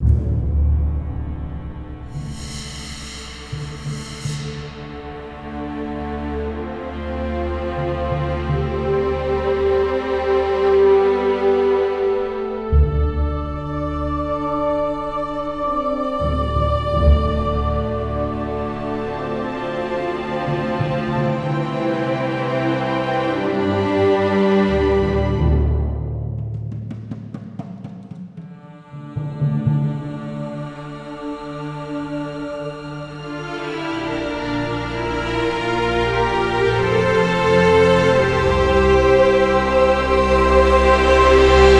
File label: Gameplay Loop noflute